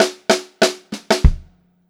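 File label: STRAIGHT FILL, Funk-Groove Drumloops; USB Soundscan vol.38; AKAI; 90 s Sample CDs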